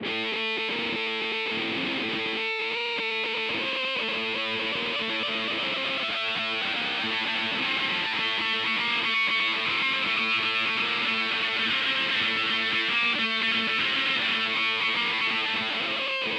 wah disto

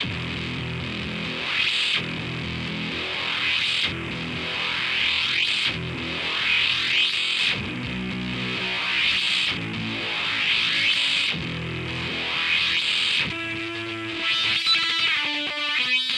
disto dirty wah

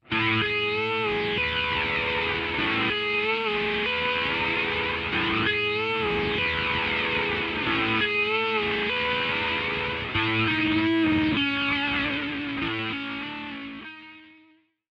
flanger phaser

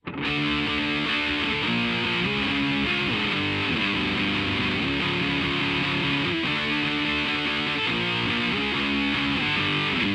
disto fuzzy

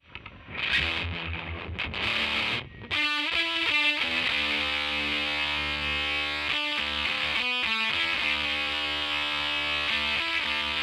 bass crazy dist

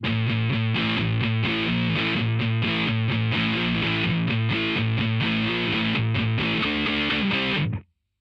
disto lead

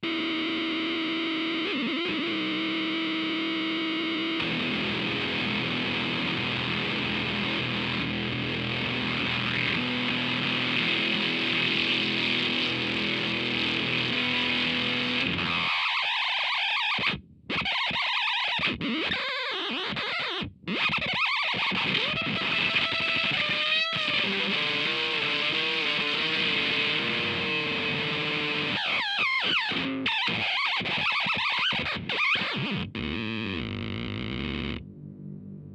dirty wah

